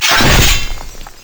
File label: yasuo base basic attack; LoL